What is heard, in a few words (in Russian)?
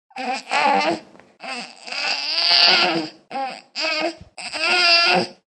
lepet